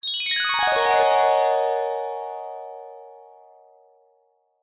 fantasy magical glissando bells descend resonate mallets; zapsplat